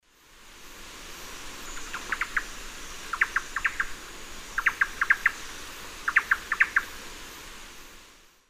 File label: Campylorhynchus turdinus, song; S. America; Eastern Lowlands